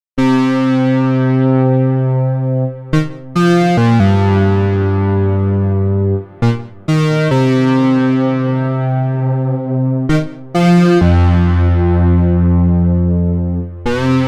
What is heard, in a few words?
sixtrak unison